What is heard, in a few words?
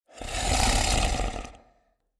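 Zombie; Clam; Blind Survivor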